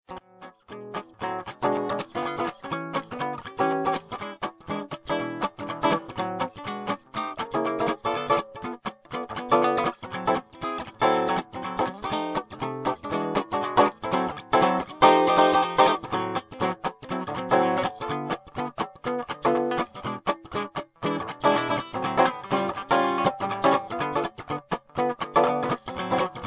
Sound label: rhythm